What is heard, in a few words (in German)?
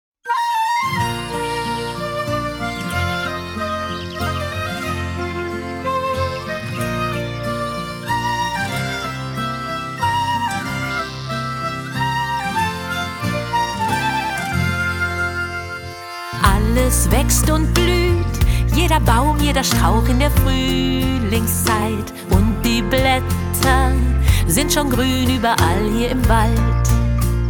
Wenn die Vögel singen, Streichquintett Nr. 5 Menuetto; Boccherini